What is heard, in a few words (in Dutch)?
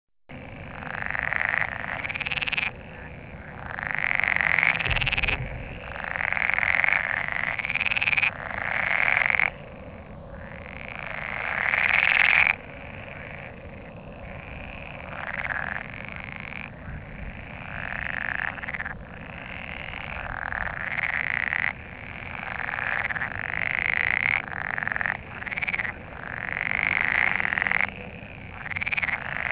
poel groene kikker paringsroep